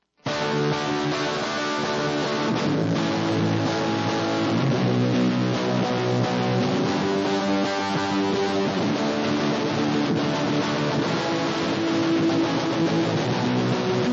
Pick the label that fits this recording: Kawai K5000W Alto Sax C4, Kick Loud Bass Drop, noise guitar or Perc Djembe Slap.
noise guitar